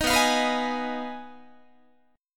B 6th Flat 5th, down, Guitar; B6b5; strummed; 18,19,20,21,19,19

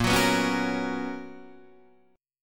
B Major 7th Suspended 2nd, chord

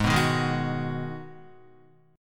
Ab Minor 7th down Guitar; strummed; JGuitar

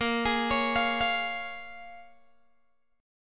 Bb Minor 7th Bb, Guitar, Open G

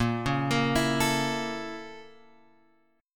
A sharp Minor Major 7th Flat 5th A sharp; A#mM7b5 Chord {6 4 X 3 5 5} arpeggiated

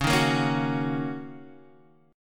Gb 6th Db

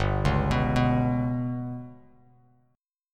G Major Flat 5th G down Bass Standard, GMb5 chord; GMb5 arpeggiated; JGuitar

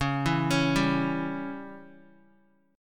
Db Diminished, Guitar; arpeggiated; JGuitar

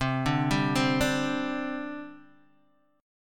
C Suspended 2nd C Guitar DADGAD; down; chord shape

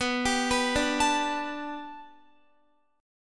B Minor 7th Sharp 5th B Guitar, chord; Bm7#5 arpeggiated

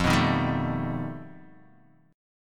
F 9th Flat 5th F down Guitar Open D

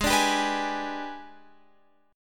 G sharp Minor Major 7th Flat 5th, Guitar Open F; G#mM7b5 strummed; x,15,14,14,14,15

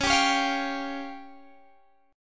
Db Major Flat 5th, Guitar Standard; strummed; JGuitar

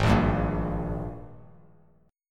G sharp Major 7th Suspended 2nd, Bass Standard; 4,1,5,3; JGuitar

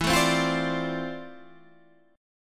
D-Minor 7th, E; Dm7/E; strummed

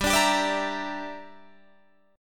G Minor 6th, Guitar Open D

